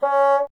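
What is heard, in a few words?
wind bassoon C#; Miroslav Vitous - Solo Instruments VOL-1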